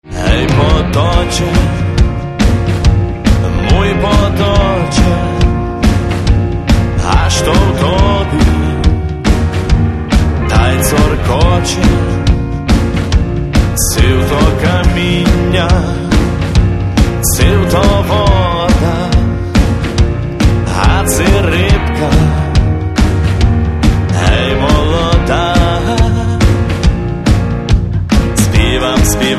rock

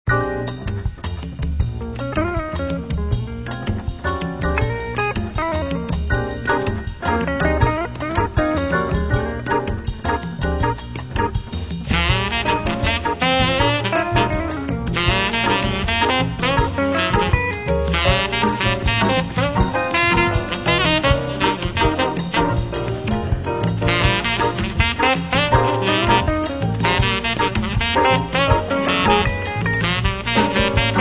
soulful sax

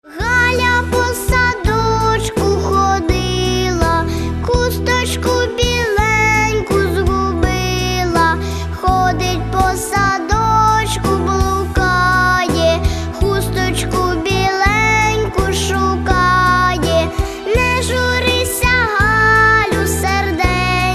Karaoke

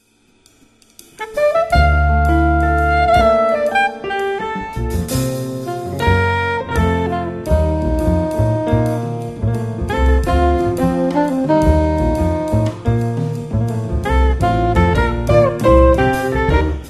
sax

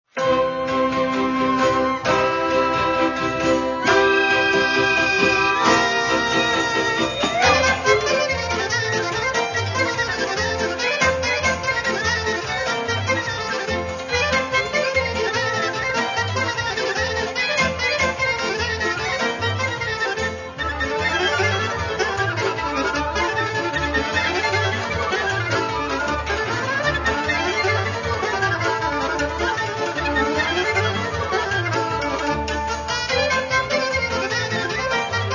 hutsul melodies

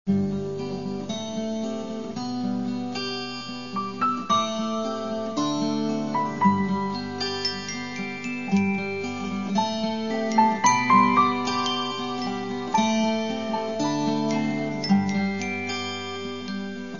orchestra

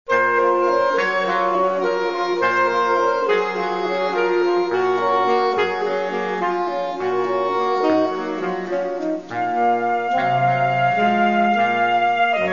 sax

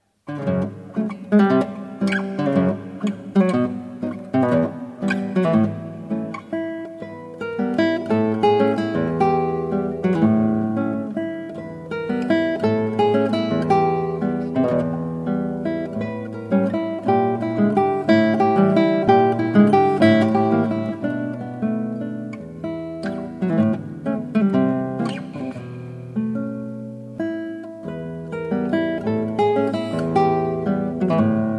Romantic Guitar

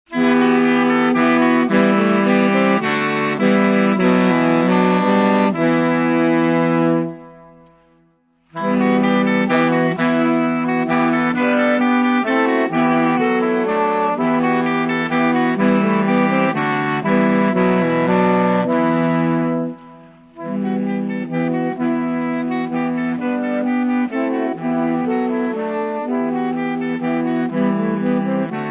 sax

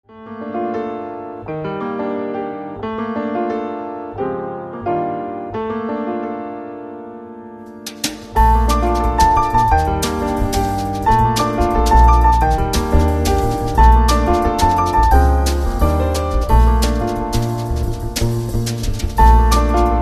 jazz kolo

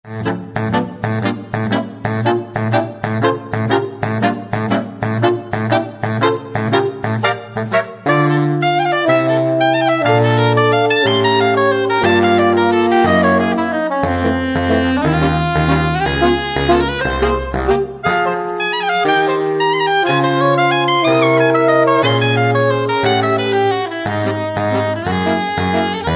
sax

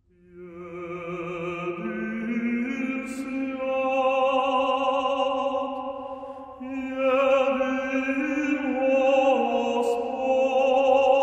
Choir Liturhia; Kyiv

